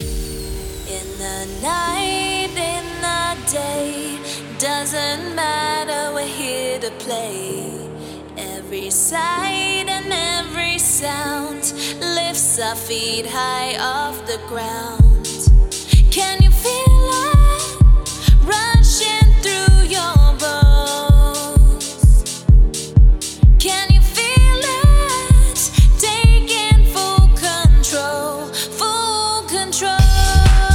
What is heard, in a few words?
House Grooves; House Grooves Unleashed; Heartbeat Rhythms